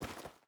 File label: footstep boots dirt walk; Rust